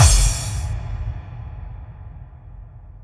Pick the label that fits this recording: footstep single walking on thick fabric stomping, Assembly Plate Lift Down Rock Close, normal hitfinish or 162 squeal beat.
normal hitfinish